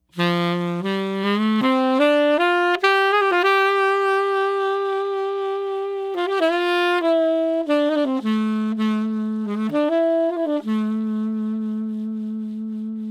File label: tenor